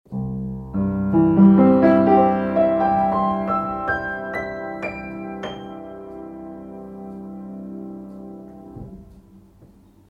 Piano, Tascam DR40

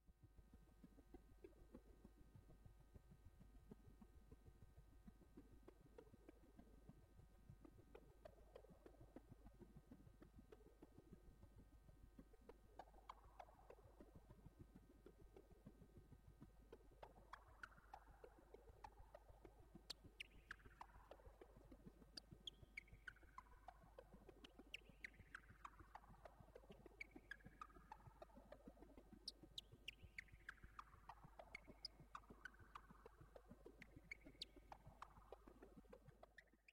Clicks mit Hall

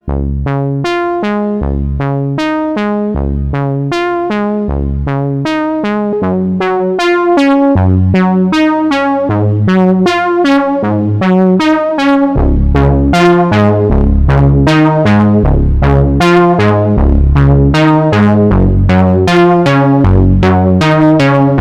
Formant Filtertypen 12dB

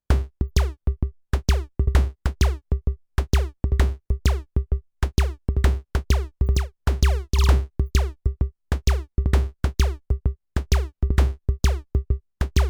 Comp Plus Synth Parallel 20 zu 1; Fredenstein Artistic

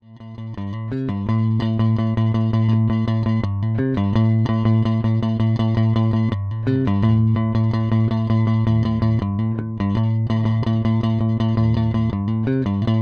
Plektrum Tonblende offen

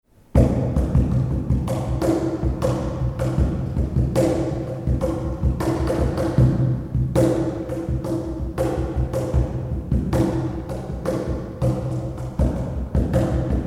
Cajon Groove über PA Raumaufnahme 10m Abstand grosse 600 Mann Halle leer; ca3sek Nachhall

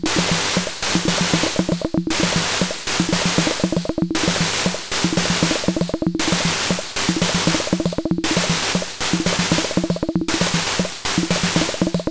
Quanalog BouBou Gate Length manuell down

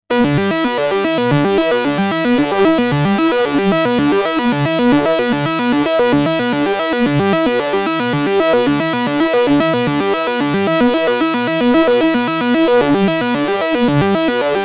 Semi Modular 4 Stage Minisequenz mit Verkürzung und Smooth

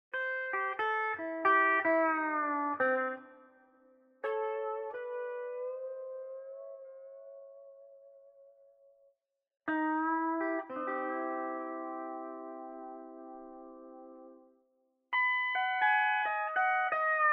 Swing Slide Guiat Phrasen

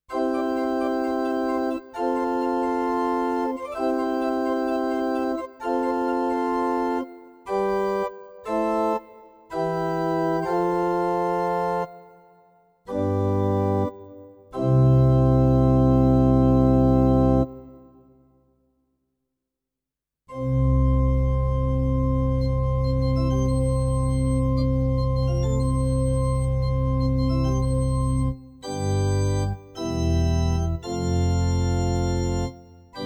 Orgeln

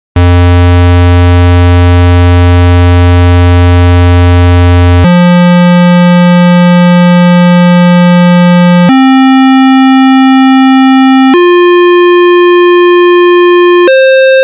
Rechteck E

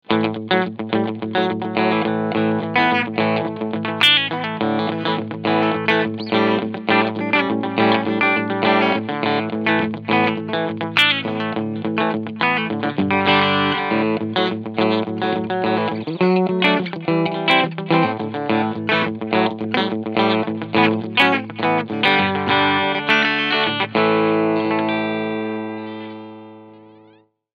TE 52 Cleansound Steg PU; Harley Benton

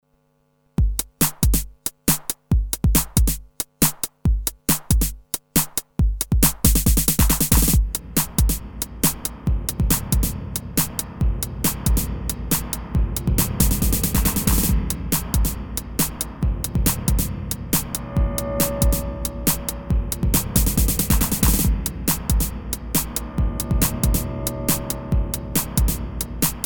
Spring Reverb Drumloop mit Nebengeräusch

